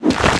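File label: mutant attack; lmctf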